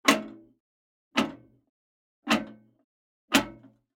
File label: Generic Button Metal Medium; boom library; The Interface